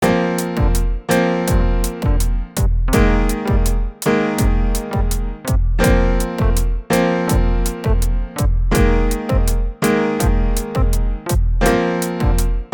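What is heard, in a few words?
saxes, Scale Practice Tracks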